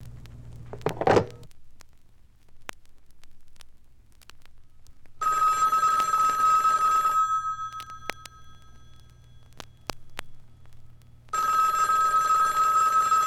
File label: Phone Ringing